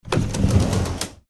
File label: door sliding open